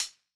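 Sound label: closed hi-hat; eddyflux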